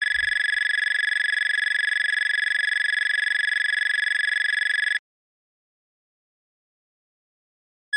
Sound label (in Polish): ton